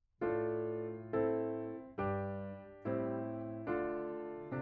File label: Piano B